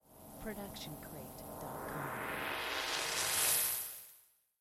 Laser Charge Up; SoundsCrate

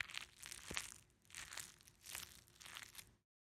squishy long; soundscrate

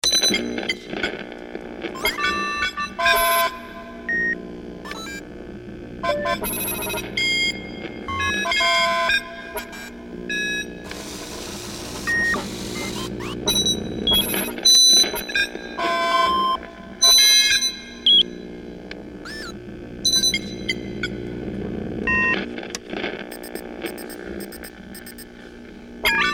SciFi Machinery; SoundsCrate

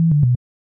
click fx tonal

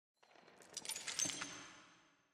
glass debris

